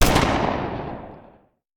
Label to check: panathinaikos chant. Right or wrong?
Wrong